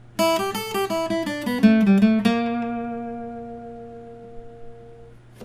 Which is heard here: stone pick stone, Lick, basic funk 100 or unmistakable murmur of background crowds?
Lick